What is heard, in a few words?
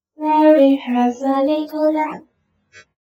sing result